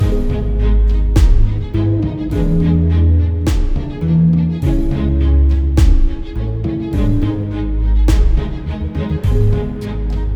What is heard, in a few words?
Ghost Battle